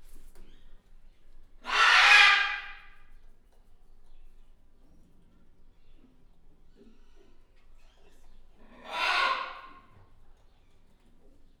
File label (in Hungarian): rikácsoló, zöldszárnyú arapapagáj; Győri Zoo 2014